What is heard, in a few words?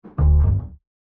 Bass, Jazz for piano